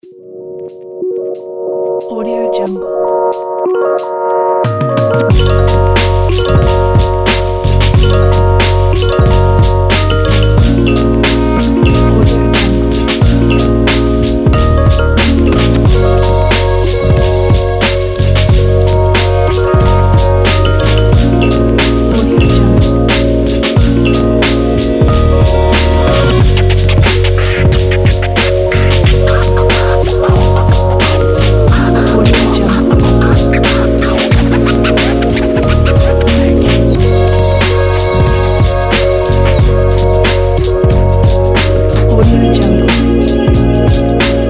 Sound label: chill urban hip hop